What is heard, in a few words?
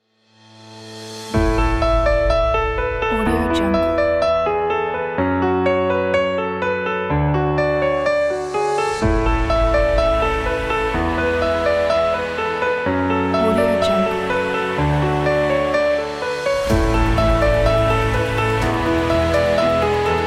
inspiring epic piano